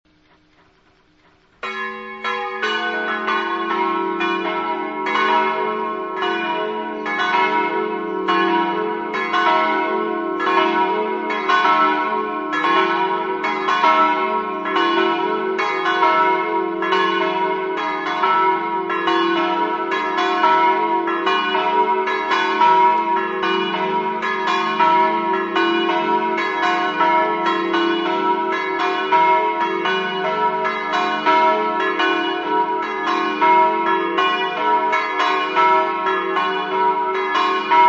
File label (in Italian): morsano, campane